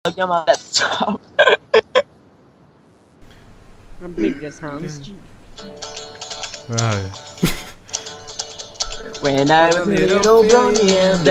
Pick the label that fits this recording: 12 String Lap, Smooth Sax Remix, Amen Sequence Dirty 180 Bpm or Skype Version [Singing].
Skype Version [Singing]